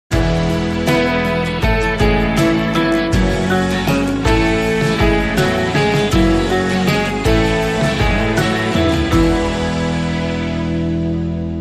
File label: Lullaby